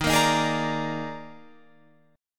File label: D sharp Major 7th Suspended 4th, D sharp, down, Guitar, Open F; strummed